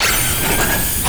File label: tank prep fire; Quake 4 Alpha; id software